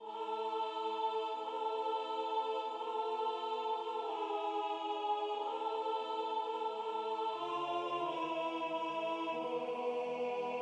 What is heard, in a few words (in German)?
Schoenster Abestaerrn Alt